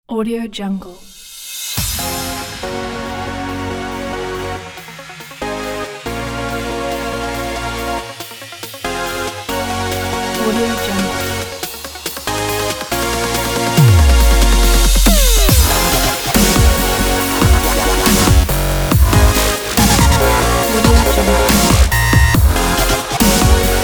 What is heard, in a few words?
sports aggressive dubstep